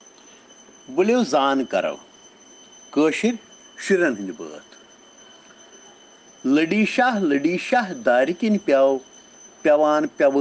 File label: Children Rhyms in Kashmiri